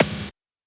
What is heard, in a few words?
Snare, PsyTrance